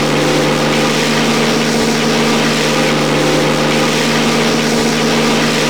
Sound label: DB605 hikouon; engine sounds